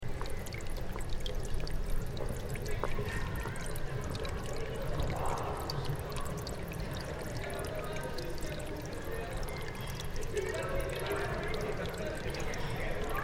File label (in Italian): turisti acqua